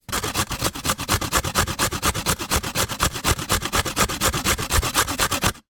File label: food grate carrot; sound effects one